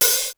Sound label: sweet hat; sr16